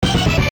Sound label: jingles HIT; Kenney's Sound Pack; Music Jingles